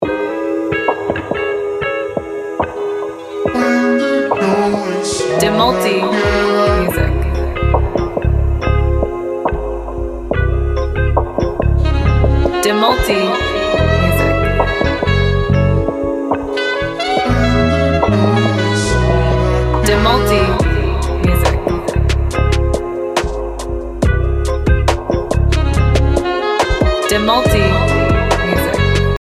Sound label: lofi